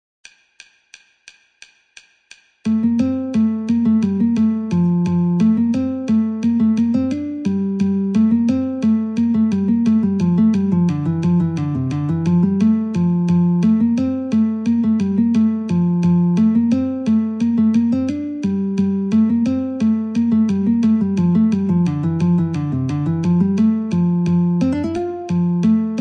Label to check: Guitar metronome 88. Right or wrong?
Right